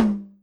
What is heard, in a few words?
Tight High Tom lpf